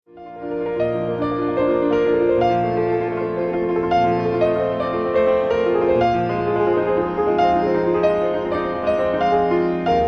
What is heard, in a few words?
solo piano